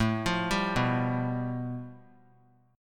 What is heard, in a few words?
A 7th Suspended 2nd Sharp 5th; A7sus2#5 arpeggiated; JGuitar